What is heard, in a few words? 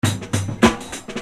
Beat, Hip-Hop Street Beats